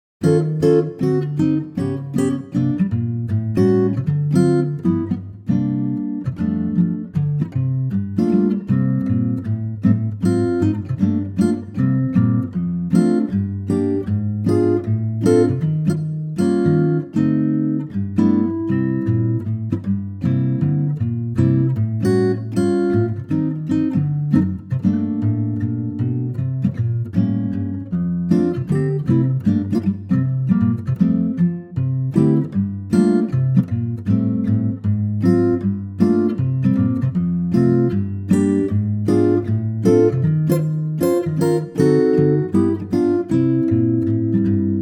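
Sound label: F acoustic guitar; key - F - vocal range - E to A; Superb acoustic guitar arrangement, at a great tempo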